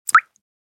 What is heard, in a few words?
Water droplet plop; liquid sound effects